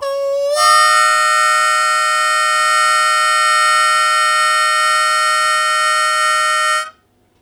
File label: Blues Chord Attack B1, Harmonica; Zero G